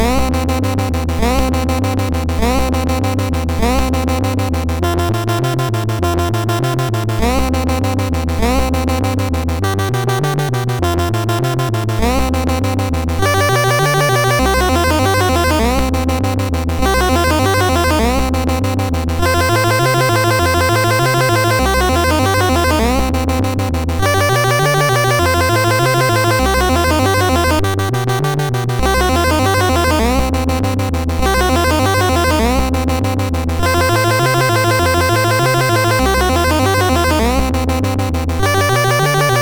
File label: sid MOS6581R2, GAMES; FireTrap